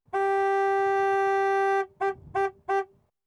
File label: Landrover Safari Diesel Horn; Farm Machinery; BBC Sound Effects Library